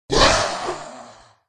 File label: orc skeleton attack voice up